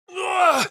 Hurt; Superman

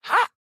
Jump

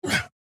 AHurt

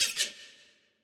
Hurt; Bats

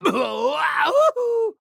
AHurt

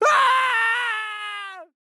Fern Death

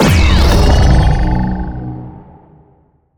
Boom Tube

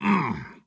Attack Medium

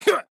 Stripe Jump

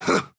Attack Small

